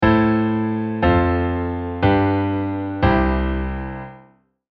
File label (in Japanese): 小室進行